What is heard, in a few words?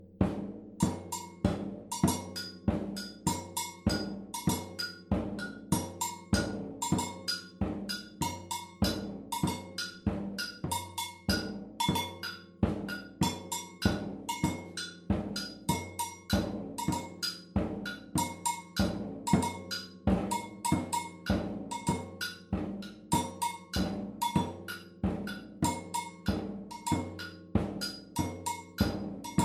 Agogo bell, batucada